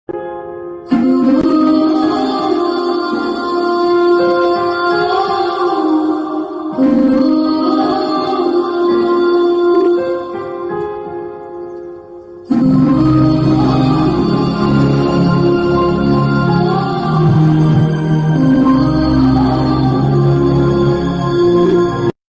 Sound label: Love Emotional BGM Ringtone; RRR Movie